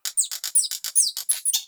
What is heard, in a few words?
Day Close Critters; Ambience; One Offs; Glades Of Andurin